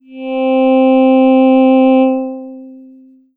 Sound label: Yamaha TX81Z Voices C4